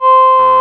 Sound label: vocoders; Sound & Vision - Gigapack I CD 2